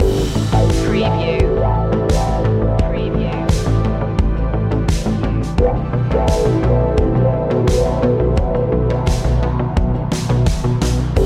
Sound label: drive mode loop